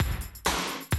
130, Classic DrumLoops

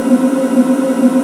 throat wind C4; Big Fish Audio; Synth City

